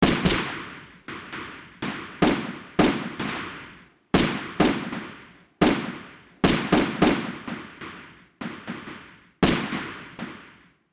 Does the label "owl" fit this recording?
No